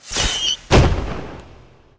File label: Bat Atk